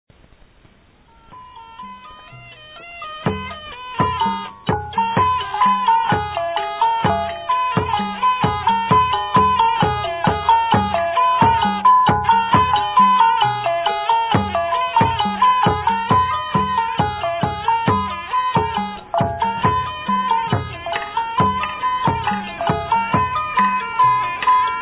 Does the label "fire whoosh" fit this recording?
No